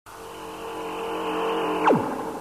BZZZZZ!; Goldorak CPC

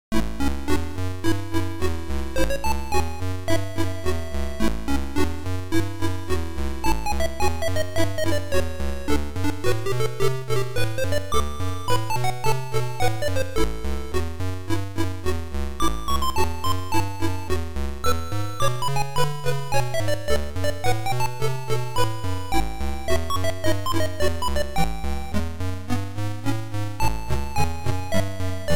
Blues; The Advanced Music System; Rainbird Software; TAMS

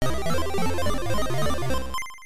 Sub Hunter Jingle; Psytronik Software; Tom & Jerry of GPA; StArkos

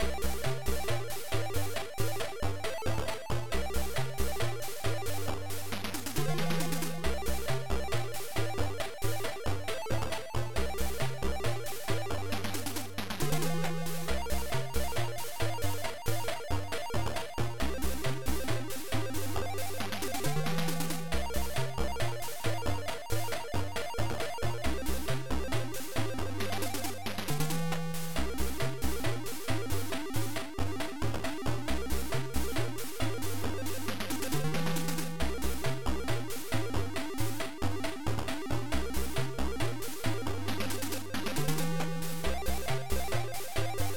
Music JazzRock; Magic Sound

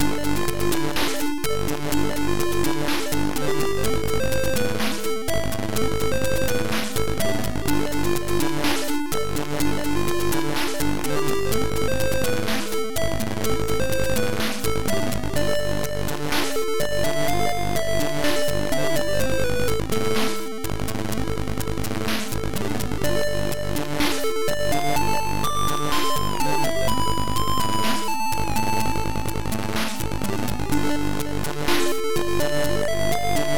Game Won Music; SubHunter; Psytronik

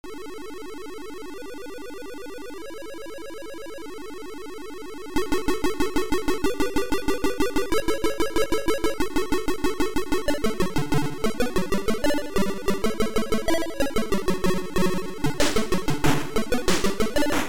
Advert; Maxi Micro 7; Maxi Micro Team; Duffy; Music Pro

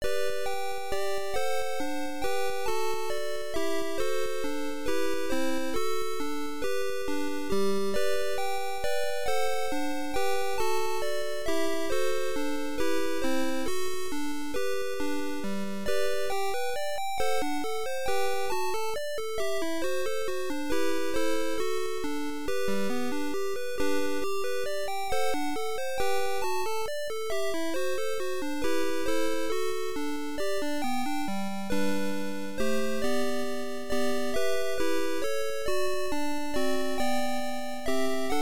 Music Menuet; Magic Sound

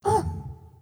hurt; shadow

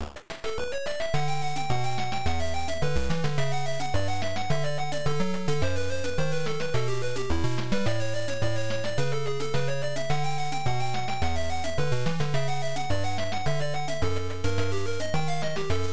Music Chorinho; Magic Sound

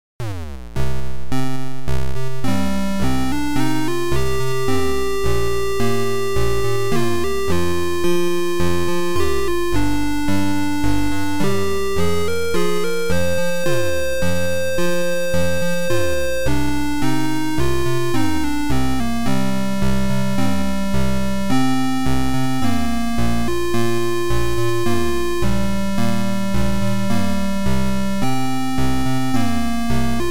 Game Over; Infernal House; Lankhor

